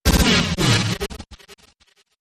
Interrupted Static

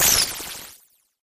Course Spit Zap

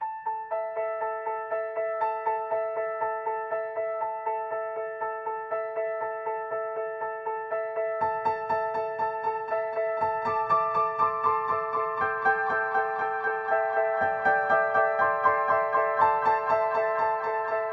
Piano Only

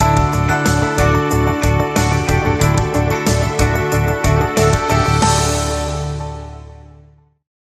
Sting

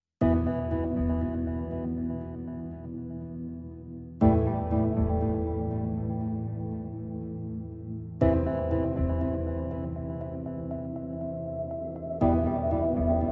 Empty, Piano Only

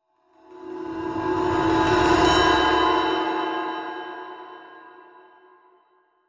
High Wash Roll

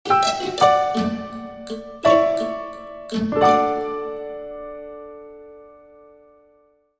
The Drunken Elves, Sting

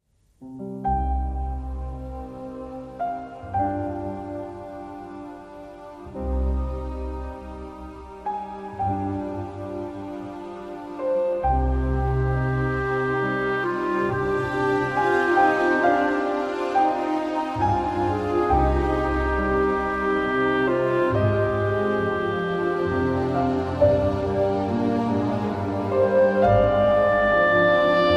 Melody With Clarinet

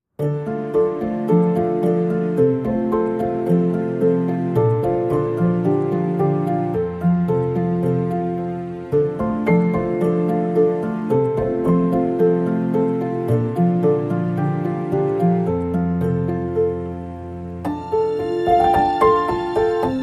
Solo Piano No Highest Piano Mix

